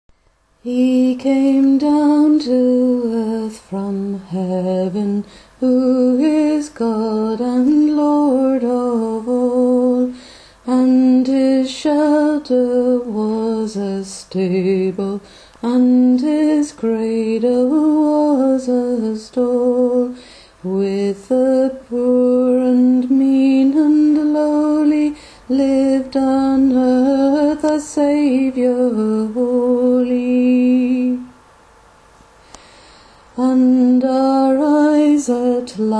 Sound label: tenor voice